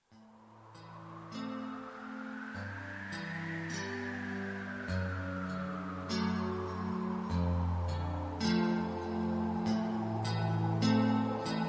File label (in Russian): saksofon; bez slow